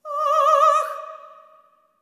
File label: SOP. TRAD, Symphony of Voices; Spectrasonics